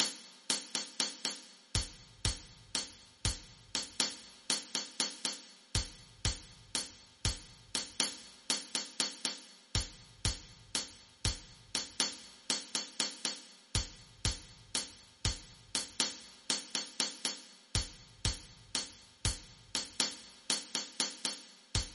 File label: bolero, traditional rhythm